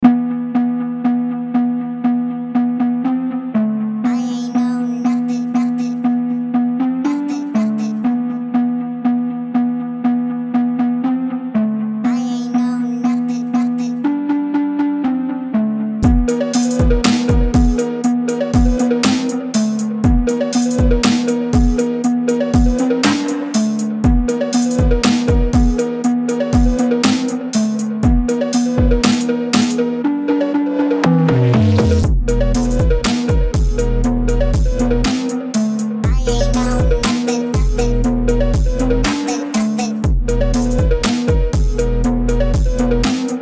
phonk

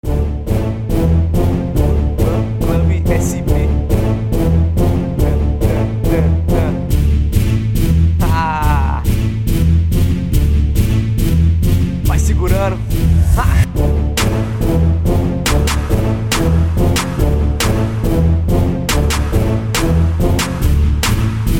rap; soldados do rap

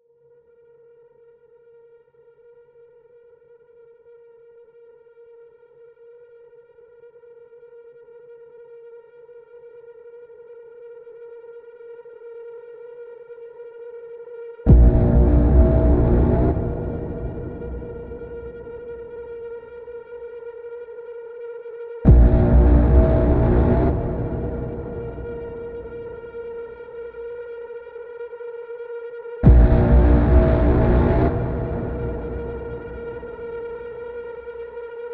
epic horror build up music dark; dark trailer music

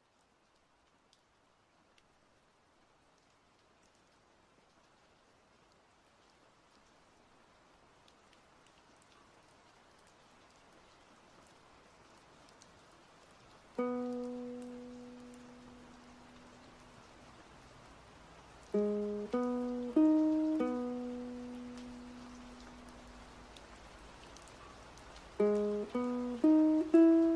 Rain, Ukulele and Relaxing at Dongmak Beach

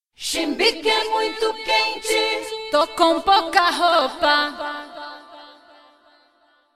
acapella

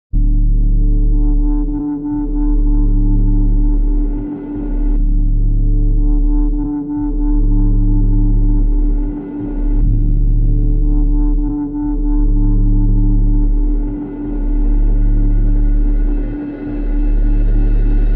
fundo musical suspense terror horror themes; musicas de terror soundtrack

